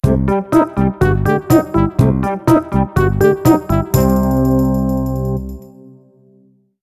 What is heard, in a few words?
Mission Failed